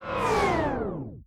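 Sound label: wild rainbow exit sound; starburstxxxtremeusa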